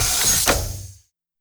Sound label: Decontamination Door Close, sound effects; Among Us